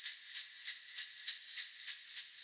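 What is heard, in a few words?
sleighbell loop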